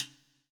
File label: POWER RIM